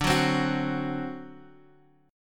C Suspended 2nd Flat 5th D

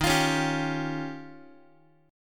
Eb 9th, Guitar; strummed; x,11,8,11,11,x; JGuitar

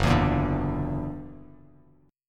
Gb Augmented 7th Gb down Bass Standard; Gb+7 strummed

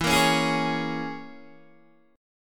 E 6th Flat 5th E down Guitar Open D

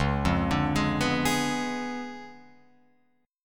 Db 7th Flat 9th, Guitar, Open F; Db7b9 arpeggiated; JGuitar

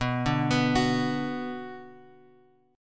B Minor B; Bm Chord {X 2 0 X 0 2} arpeggiated

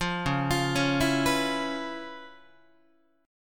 Db 7th Sharp 9th Db down Guitar; Db7#9 arpeggiated; JGuitar

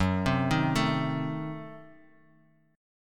Gb Diminished 7th; arpeggiated; JGuitar

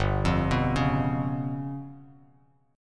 G 7th Flat 5th down Bass Standard; arpeggiated; JGuitar

